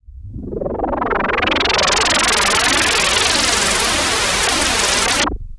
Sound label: Juno-60, Filtered Noise; noise hiss crackle